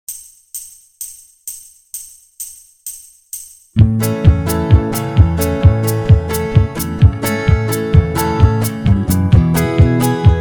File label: key of a, real bass, banjo backing tracks